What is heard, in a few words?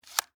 Cell Flip Phone, Technology, Cell, Flip, Phone, Antenna, Up, Cellular, Motorola; Antenna, Down, Cellular, One; BlastWave